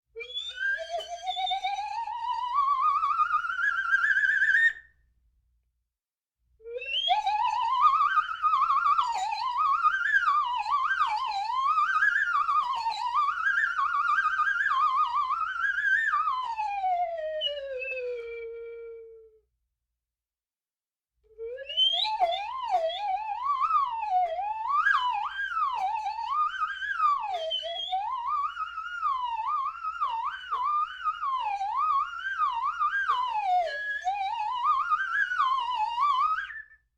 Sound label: slide whistle crazy series